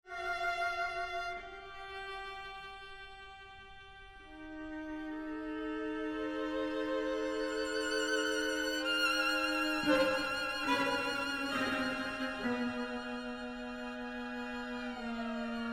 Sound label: string quartet; through glass darkly